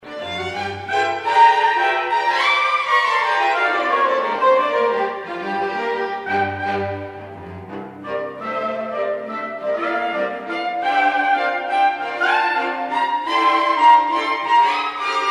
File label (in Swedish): Jazzsvit